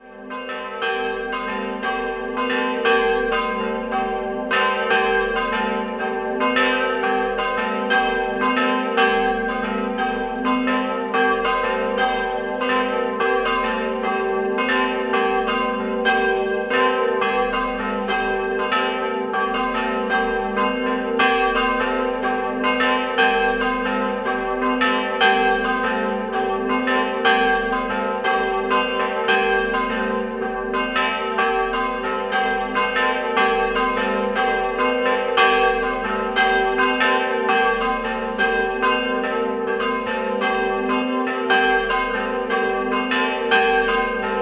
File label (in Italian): Vacile, campane